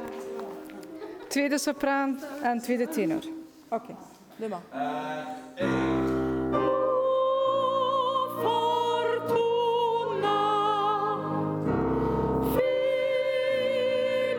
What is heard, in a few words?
sopr2 tenor2 O Fortuna; Carmina Burana